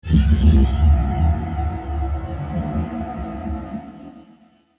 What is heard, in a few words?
Deep Impact; Low Impact Sound Effects